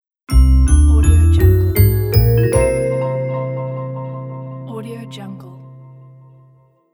cartoon danger; audiomechanica2

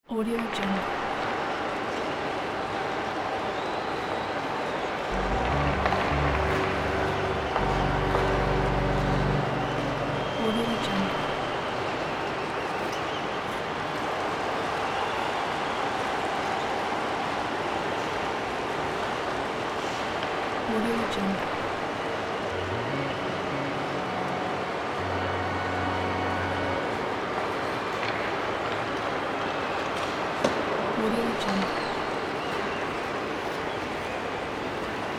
hockey game

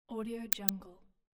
classic mouse click

